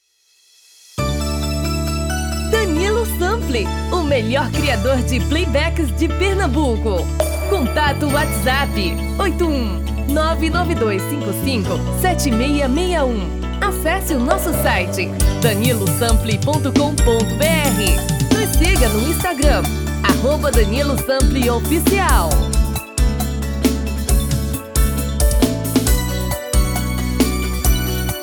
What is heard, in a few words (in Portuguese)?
Brega